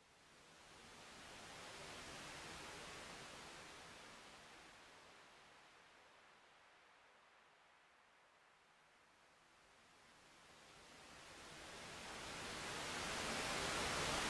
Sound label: Droned Waves